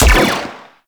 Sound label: Sniper Rifle Shot; Crp Ar Sniper Rifle